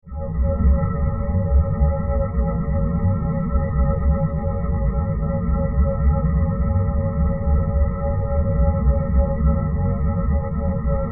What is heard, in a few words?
Science Fiction Alien Power Drone Modulation; Spacecrafts